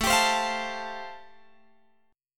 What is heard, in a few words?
A Minor 7th Sharp 5th A, Guitar, Drop D